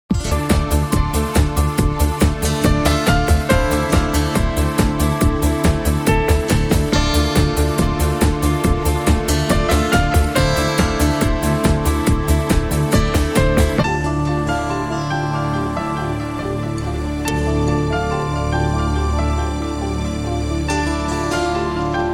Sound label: GUITAR TRANCE